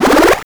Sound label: multikill